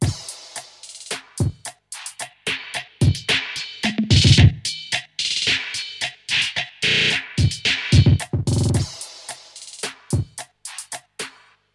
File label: Ts HipHop Kit